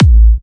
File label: A, kicks; markus hakala kicks